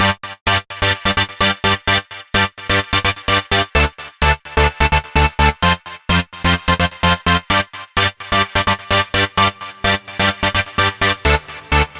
Lead Synth G

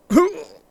Male Hurt

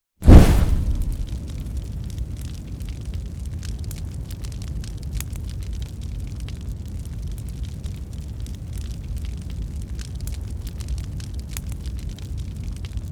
Fire Erupted

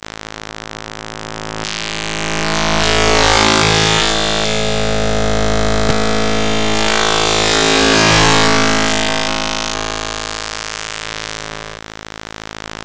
Digital Electricity Hazard

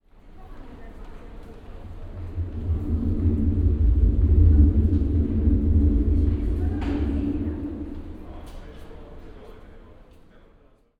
Tram Tunnel

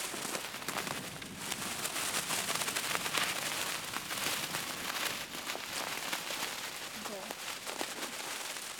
Flag Plastic Garbage Bag Flap Quick In Wind

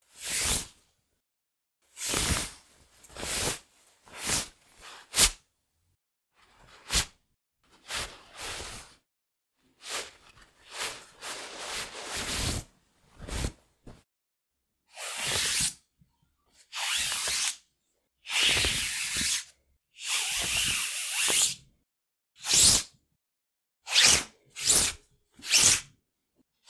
Cloth Ribbon Rustle

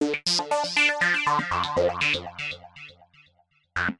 Arpeggio Free Techno 120bpm

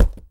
Cardboard Hit

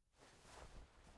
Cloth Noise

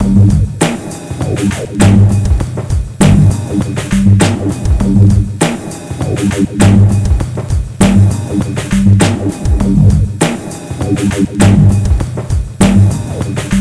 breaktrip

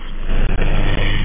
Glitched Noise

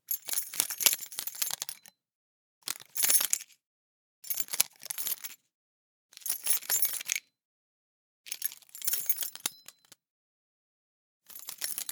Car Keys

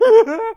Laughs

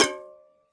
Hitting A Tin Can

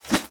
Whoosh

